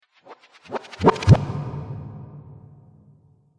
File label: Dance Riser